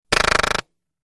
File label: Silenced Machine Gun